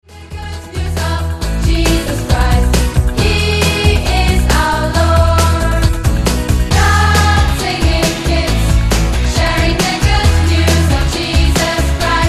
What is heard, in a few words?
singing kids